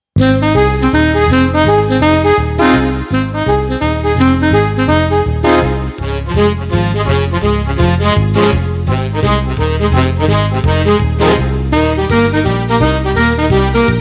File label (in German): Saxophon